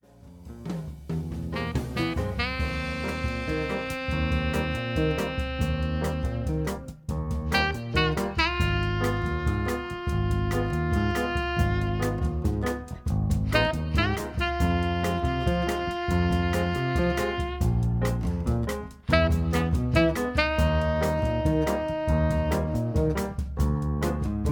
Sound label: quartet